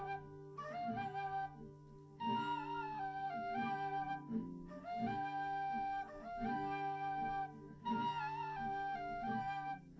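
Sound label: no drums, live recording 250ms 5cm; SiSEC11; underdetermined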